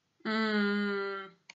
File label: hmm